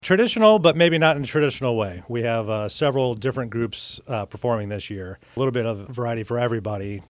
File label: Brass; 1st infantry division band; holiday concerts